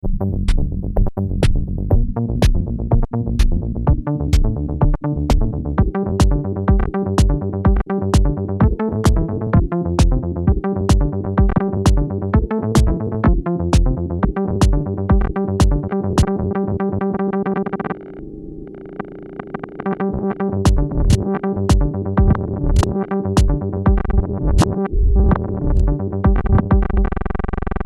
Loop

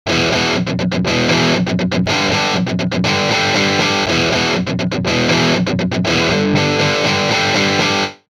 Heavy Hard Scratcher

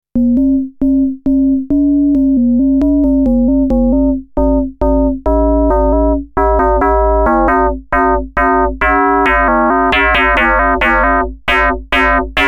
FM bass; MFB Dominion Club

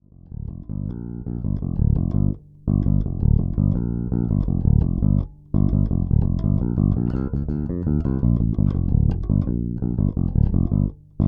Both Pickups Bass boost Mid boost Treble cut

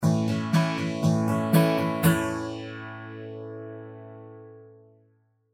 PCM81 Phaser

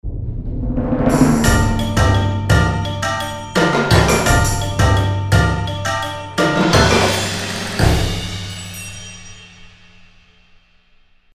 HOP Mix

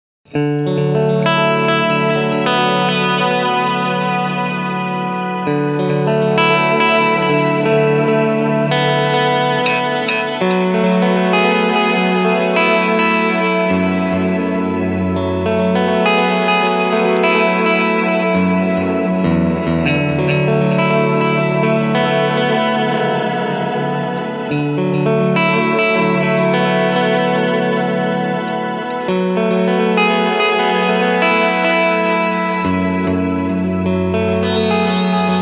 Wash

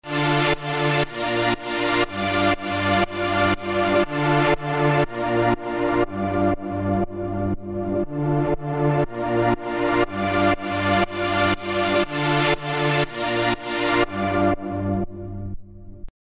Side Chain Supersaw Filter

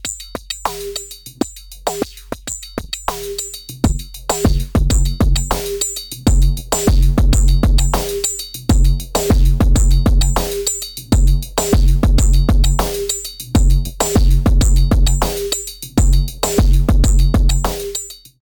Elektron Analog Heat, Bouncing Bass Drum Dirt

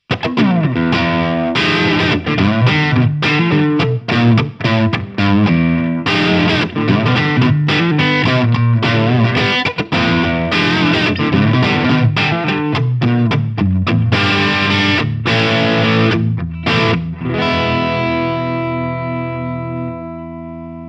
Crunch Neck Pickup

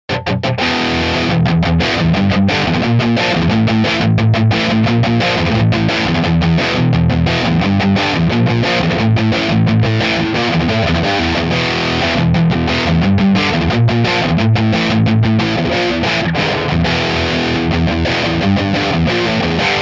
T3 Heavy

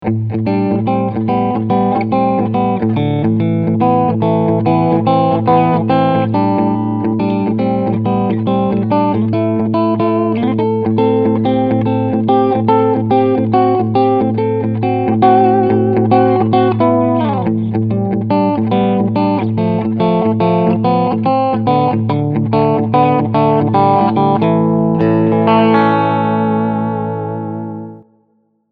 Ch1 Clean

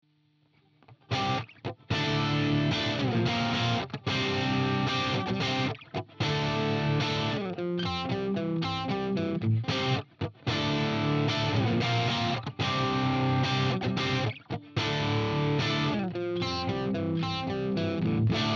Fender AmPro mid DIST

